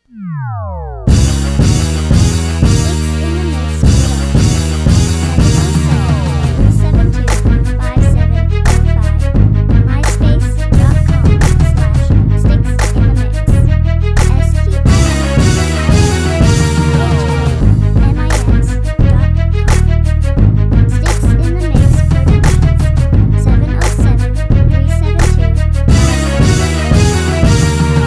fast strings

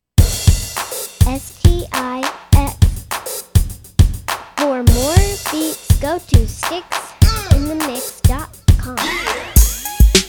drummin

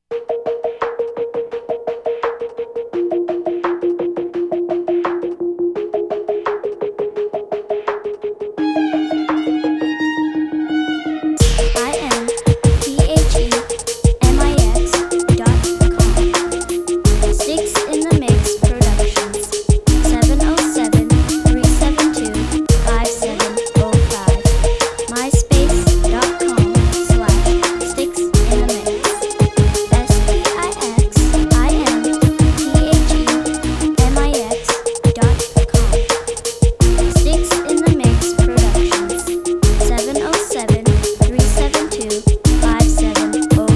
violin

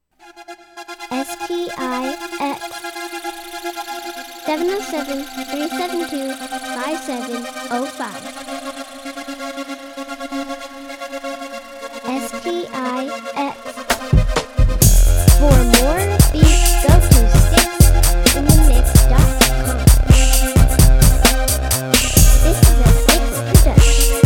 trance

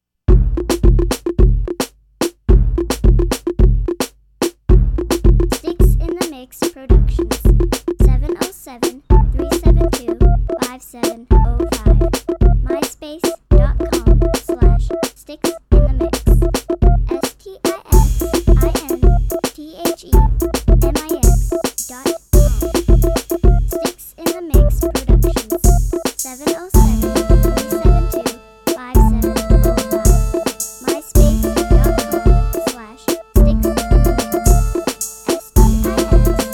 reggaetone

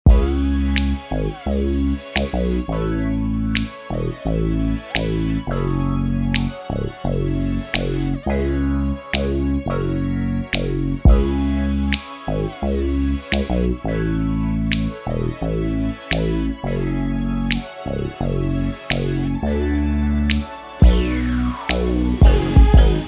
MJ Beat

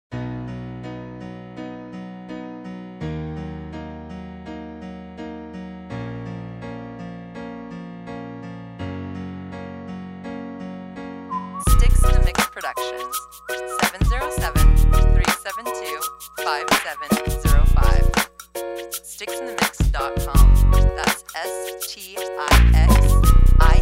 happy whistle

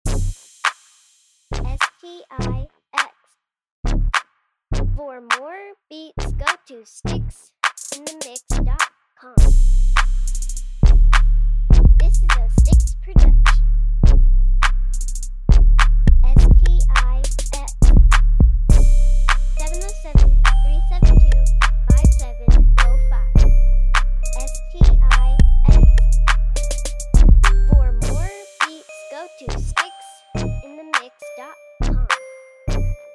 Uptempo Mob

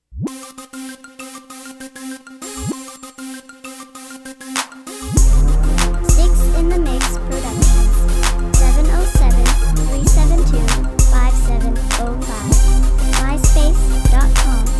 crunk

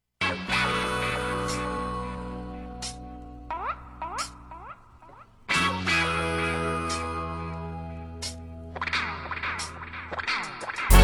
east coast horns, beats

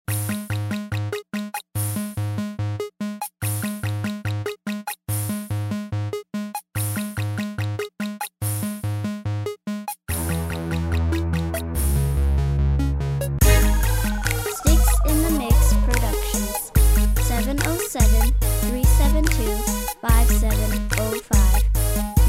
crunk snap

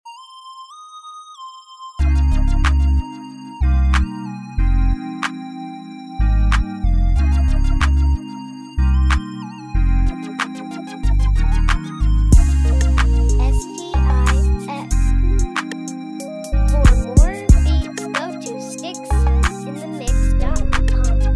Vibes

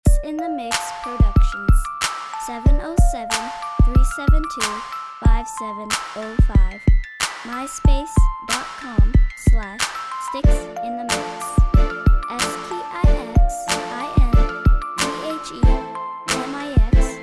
music box

